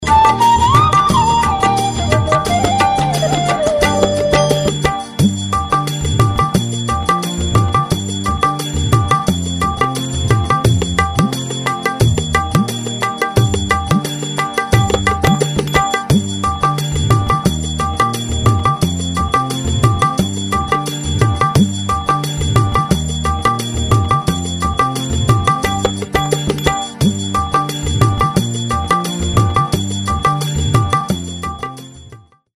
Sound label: Karaoke Song